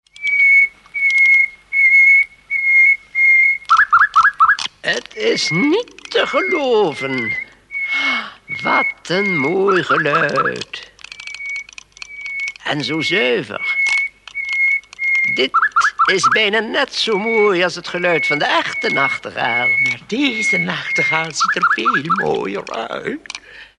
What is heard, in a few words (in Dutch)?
zang; chinese nachtegaal